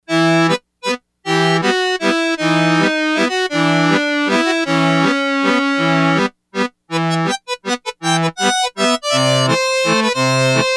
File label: Squeezebox, Yamaha DX100; Factory Patches